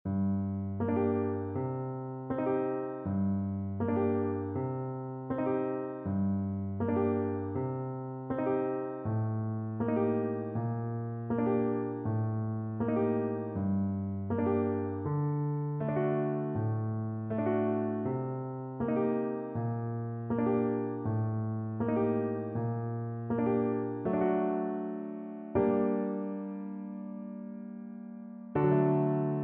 Clarinet

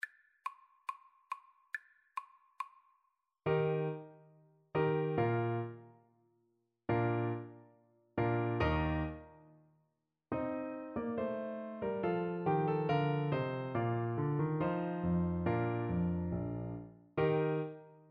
bourree, Clarinet; bach

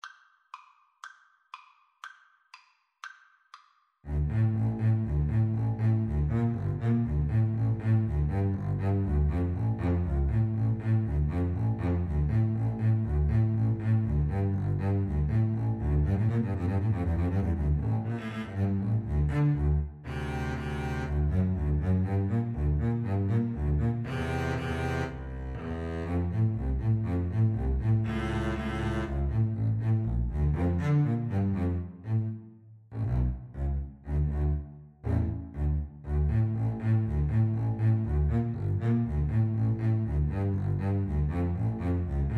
Violin Bass Duet; brahms hungarian dance 5

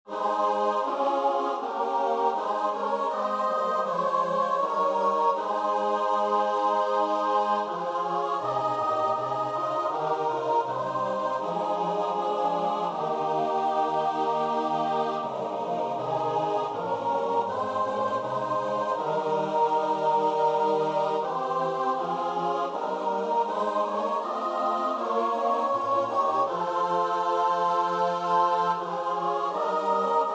st matthew passion, choir; bach

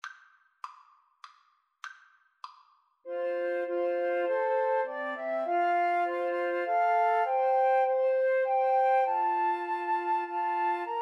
god glory, Flute Trio

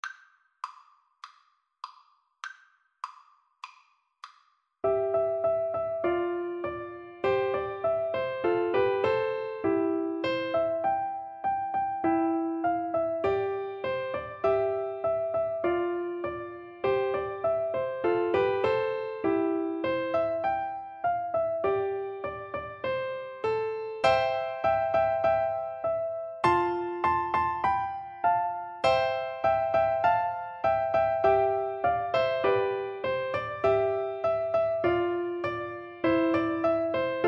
piano duet

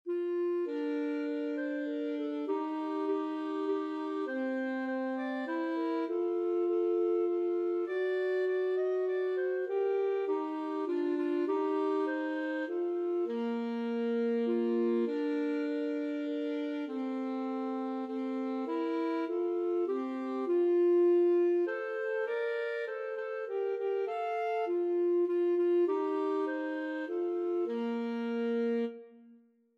clarinet saxophone duet

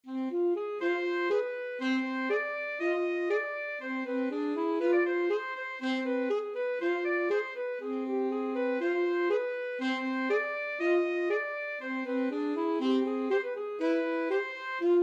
Bulgarish, alto saxophone duet

